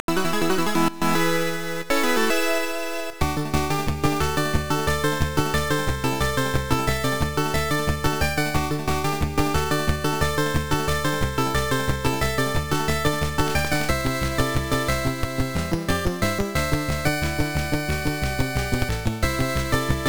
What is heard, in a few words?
Power-Up BGM, MSX; TwinBee; SCC